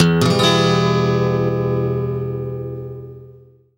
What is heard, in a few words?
STL STRNG CRD; Best Service; World Colours